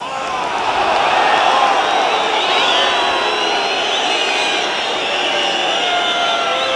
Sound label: houhou